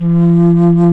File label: FLT, Bass Flute, BsFlt vib; Roland L-CDX-03 Disk 1